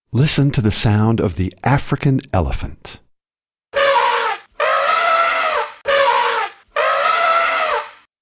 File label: Elephant Sounds